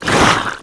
Mud Monster Attack Hiss; Mars Sortie

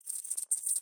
Env Void Grasshopper

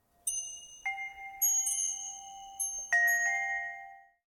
Ambience Scatter Chimes; Josh Journey Darkness Totems; PS4, Switch, Windows, Xbox One gamerip; Santuario

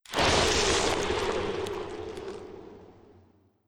Ice; Legend of Keepers (gamerip) (2019); Naga Ice

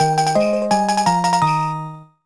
Obtained a Key Item!; gamerip

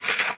Impaler Earth Out

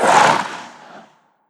Ghost Scare

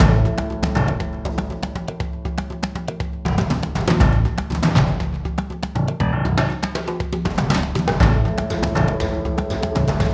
Barren Combat LOOP; Mars Sortie

